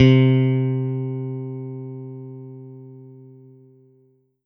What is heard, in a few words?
Alesis Fusion Clean Guitar C3